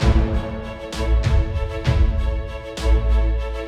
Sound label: Freespin Theme Splash; oceans treasure